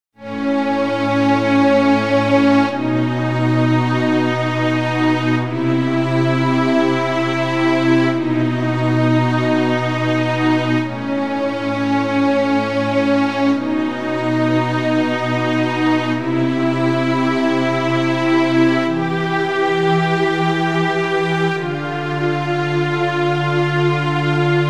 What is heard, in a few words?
Dramatic Strings Dark Theme With Bright End